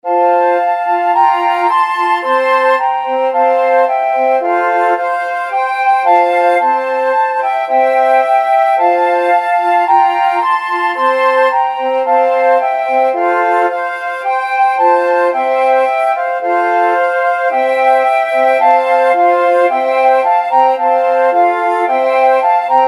ode to joy, flute quartet

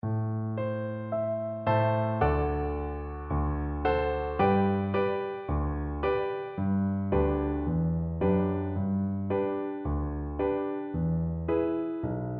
fado, cello

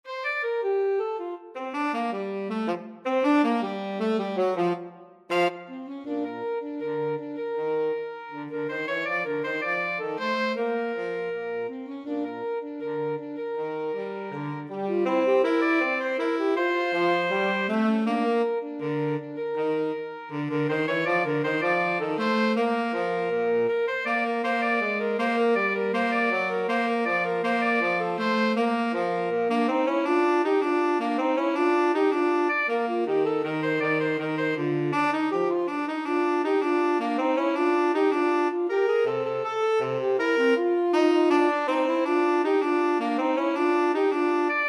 the entertainer, alto tenor sax duet

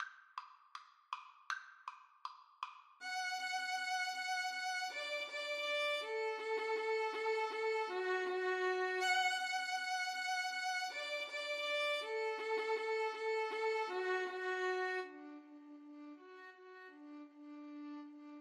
marcia violin duet

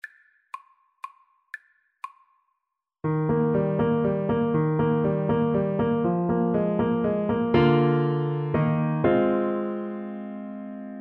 minuet, Clarinet; haydn; hob ix 8

